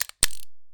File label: Gun Switching